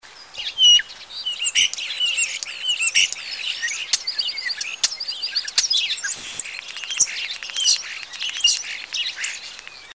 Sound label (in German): Star Gesang; Günter Tembrock; Vogelphilipp; Vogelstimmen